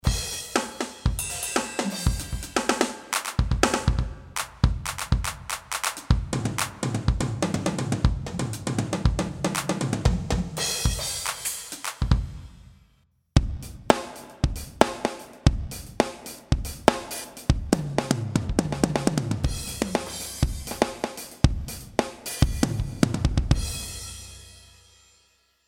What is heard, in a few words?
drums; IK; iRig; SampleTank iOS